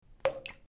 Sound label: water droplets small stone